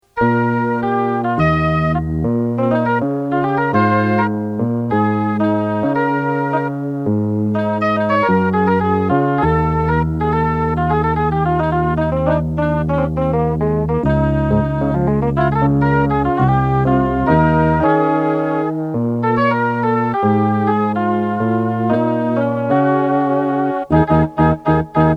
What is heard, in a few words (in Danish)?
shennai klarinet pipes